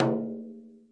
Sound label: miyadaiko B2, Japanese Drums; ETHNIC and WORLD PERCUSSION